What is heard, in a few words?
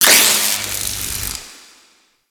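Corpus Infested Machine Spark; Warframe; Ambience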